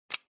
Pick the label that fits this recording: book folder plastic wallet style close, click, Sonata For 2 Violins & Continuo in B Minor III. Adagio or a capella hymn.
click